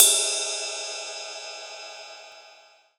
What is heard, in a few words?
Paiste, ALL DRUMS